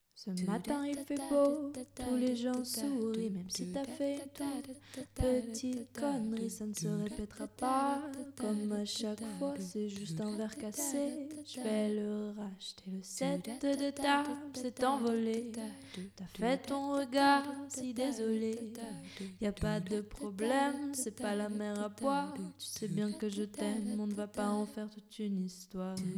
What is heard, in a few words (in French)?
Verre cassé